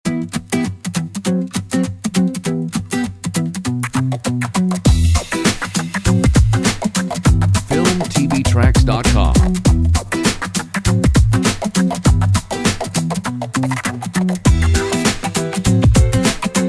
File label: BOSSA HIP HOP; comedy funny